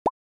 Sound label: Click Sound; Degullmok